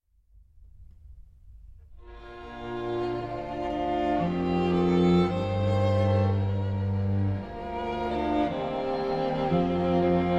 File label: Arcato Quartet